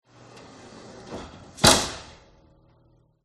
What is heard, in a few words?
close door oven; fox audio